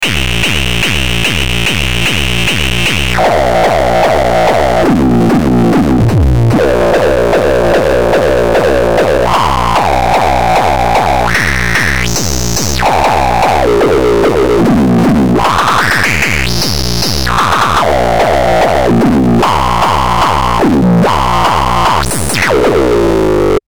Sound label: synth core kick